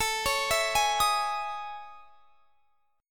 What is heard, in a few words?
A Major Sharp 11th; AM#11 arpeggiated